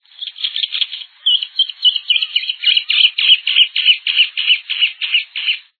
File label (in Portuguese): martim-pescadot-verde